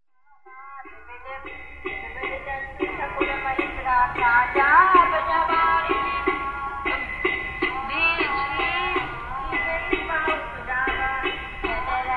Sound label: morning multitrack chanting; radhakund